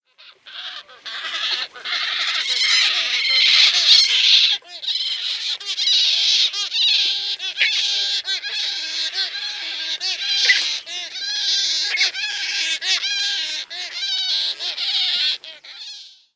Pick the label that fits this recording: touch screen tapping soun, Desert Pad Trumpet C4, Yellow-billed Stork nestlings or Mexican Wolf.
Yellow-billed Stork nestlings